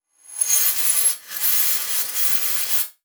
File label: Transition Metallic Warble